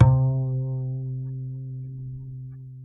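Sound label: acoustic bass vibrato C 3, right; Masterbits CLIMAX 7 - Bass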